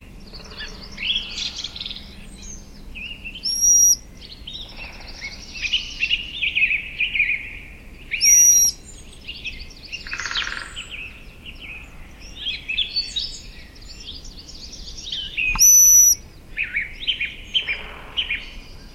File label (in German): sperlingskauz